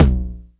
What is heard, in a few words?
Toms; Mutekki Media; Vengeance Electroshock Vol.2; VES2 Percussion